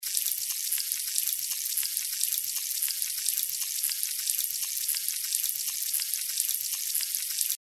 sparkling noisy dry

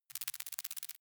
critter move

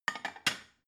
plate shelve soft

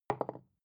glass put down wobble short

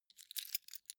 key ring large place soft

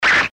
eastern swishes

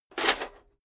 metal plate armor movement single

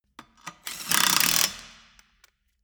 impact wrench screw fast single

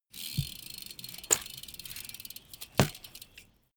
bmx maneuver free lift tire

